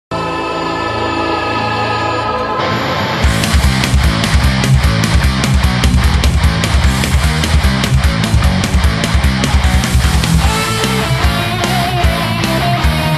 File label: Metal Cover